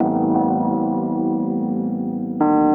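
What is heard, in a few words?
KEYS loop L, Drum & Bass 175 BPM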